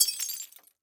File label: plate crash, ceramic plate drop and break